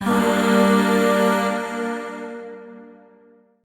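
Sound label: Void Shawzin Minor Chord; Warframe; Zariman; Chords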